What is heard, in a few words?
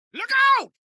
town human road; Blind Drive